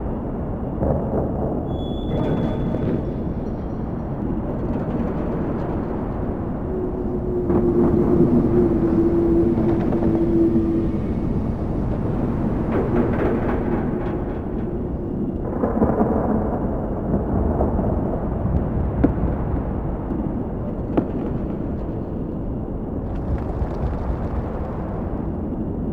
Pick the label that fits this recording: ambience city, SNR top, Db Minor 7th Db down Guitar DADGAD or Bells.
ambience city